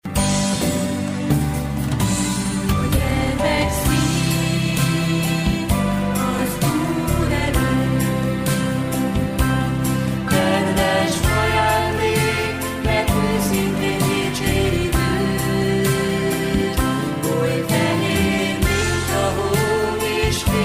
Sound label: Jingle; Nyisd ki a szíved; Esti mese; szombat este